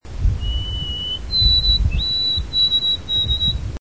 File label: sparrow song